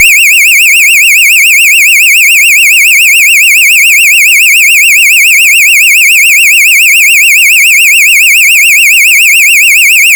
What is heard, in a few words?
Sweeping, combined signal; pfannenberg; signaling-technology; combined-signaling